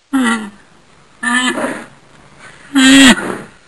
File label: rhino; animal sounds